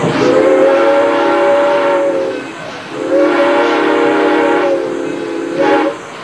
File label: steam